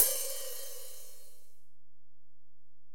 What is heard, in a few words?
HiHat, Alesis DM-PRO